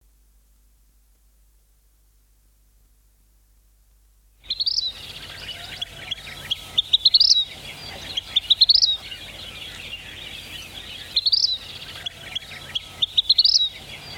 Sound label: vermillion flycatcher, songbirds